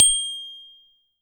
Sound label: Bell Chimes; Samuelgremaud